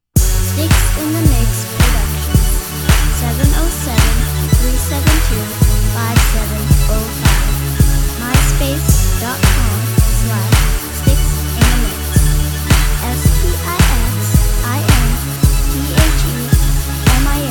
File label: contemporary hiphop dance